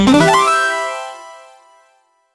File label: happy synth 8 bit win sou